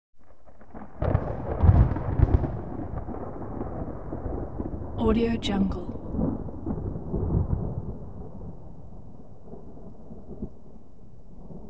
thunder rippling by; hollywoodedge

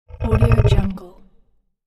low monster growl

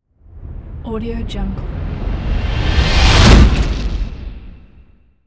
rising synth stinger whoosh and impact

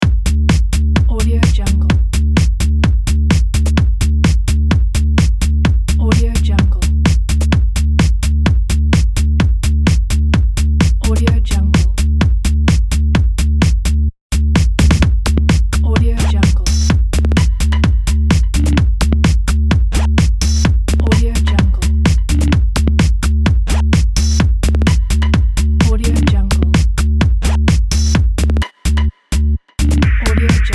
dance loop

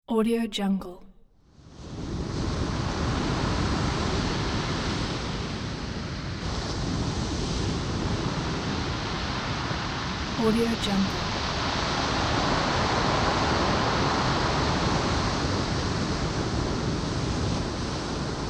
heavy ocean waves

